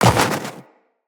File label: Run Snow, Footsteps; Tenno